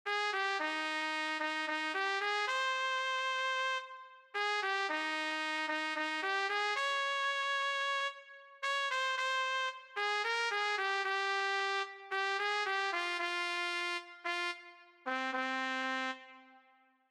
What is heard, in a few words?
Trumpet; 吹奏楽; R6 吹奏楽部 卒部式